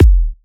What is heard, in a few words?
Techno Kick